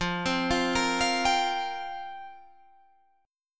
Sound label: F Suspended 2nd Suspended 4th F down Guitar Drop D